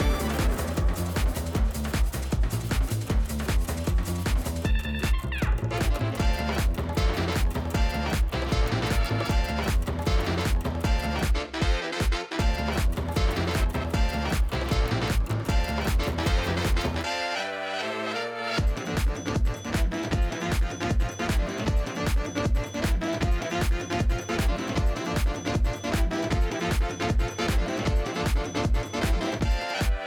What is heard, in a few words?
Main Loop